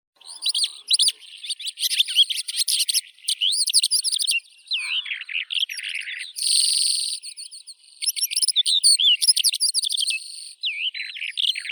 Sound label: zhavoronok stepnoi melanocorypha calandra; zvuki prirodi